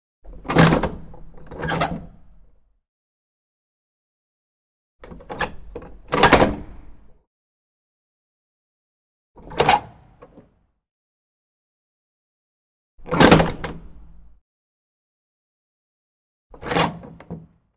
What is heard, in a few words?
Large Metal Door 1 Lat, knocks